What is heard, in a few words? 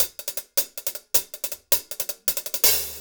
Top Ends; Zero-G; Monster Beats